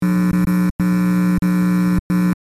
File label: Electrical buzzing burst speaker glitch long; sound design